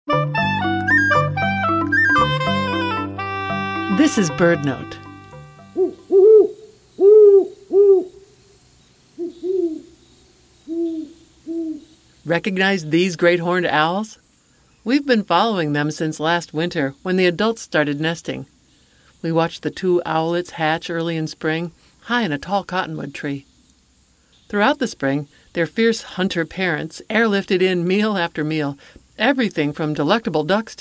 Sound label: great horned owl family in summer